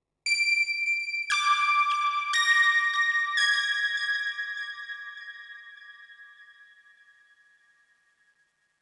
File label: Oneshot Synth